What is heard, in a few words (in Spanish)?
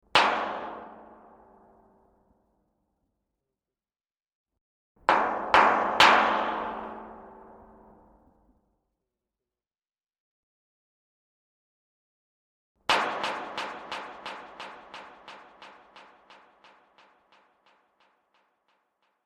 puerta golpes; efectos